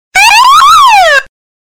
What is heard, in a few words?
funny alarm ring